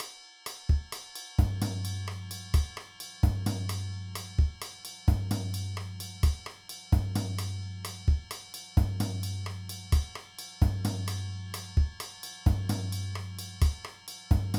Salsa Beat

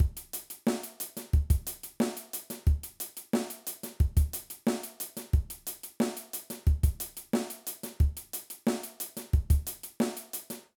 Intermediate Drum Beats

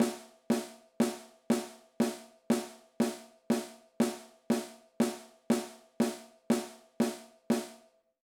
Snare Drum Exercise

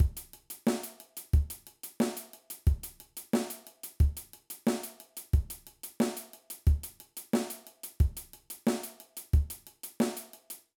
Rock Drum Beat

